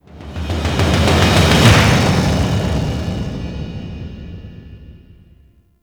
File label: PvP Game Start Message